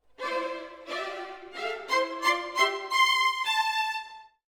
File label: Orchestra, Jazz for piano